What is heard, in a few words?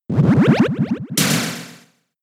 Block; Pokemon; gen 6; XY; ORAS